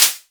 hat; Ableton; Wicked Kits; analog Bully

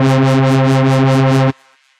Analog Bass; Glue; Ableton; Factory Packs; Retro Synths; Analog Bass Vol 1

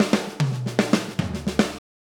145 Texas Shuffle Drums; Pop Rock

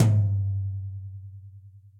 Tom Multirod Low DryAcoustic; Drum Hits; Ableton; Factory Packs; Session Drums Studio

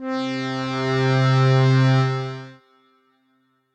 Stacked Organs; Instruments; Ableton; Factory Packs; Lost and Found; Lost Keys

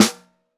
Snare Bottom

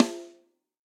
Snare Rim; Drum Hits; Standard Hits; Cop Style; Ableton; Factory Packs; Session Drums Studio

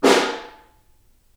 Snare Far; Ableton Foundation FreePacks; Snare Designer

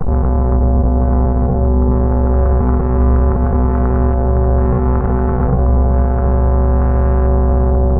Chime Drone; Instruments; Laboratory

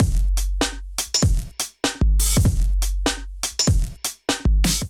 Groove 94 BPM, Drums; MIDI Clips; Allee Gate; Ableton; Factory Packs; Chop and Swing

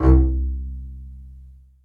Double Bass Solo Staccato; Ableton; Orchestral Strings

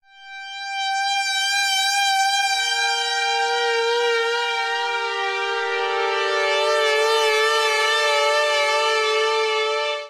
Pad Gm [95 Bpm]; Lone Ranger

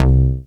Stabbed, Analog Bass; Ableton; Retro Synths; Analog Bass Vol 2

